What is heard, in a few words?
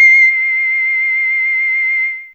Whist C5, Humans; Humans1